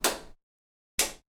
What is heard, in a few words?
Light Switches